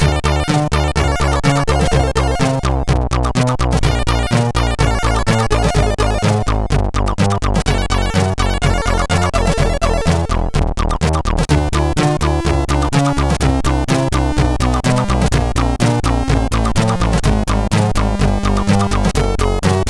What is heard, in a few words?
CSG 8580R5